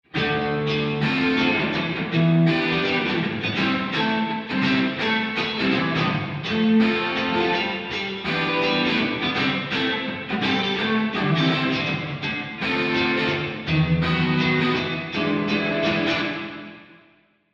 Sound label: clean e guitar wet